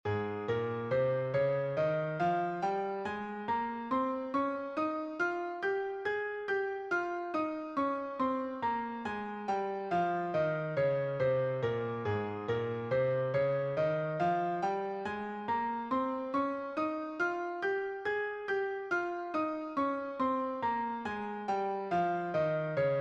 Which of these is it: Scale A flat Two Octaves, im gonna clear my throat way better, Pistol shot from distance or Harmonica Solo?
Scale A flat Two Octaves